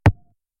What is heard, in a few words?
strike